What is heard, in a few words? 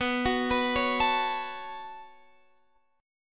B 7th Suspended 2nd, Guitar, Drop D